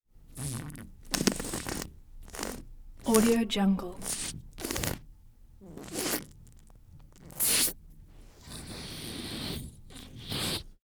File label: balloon air deflate wet bubbly air releases; prosoundfx